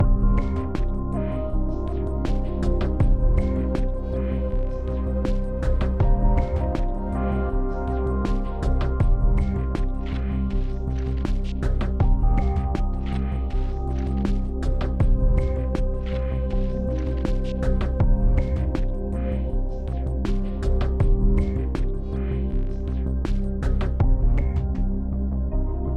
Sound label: Exploration Night 8CH 80BPM; Downtown Act3